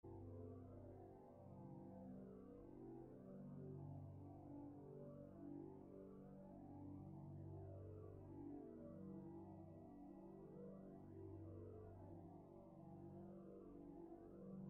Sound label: Piano Passage, 48Hz